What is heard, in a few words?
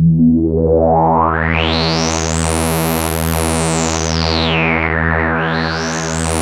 ARP 2600 E2, synthesizer